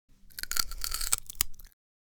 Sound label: Cartoon crunching bite